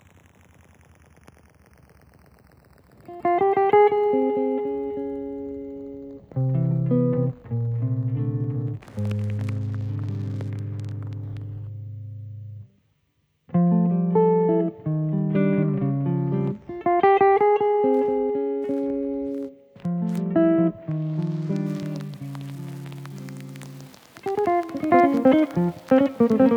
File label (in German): Weisses Rauschen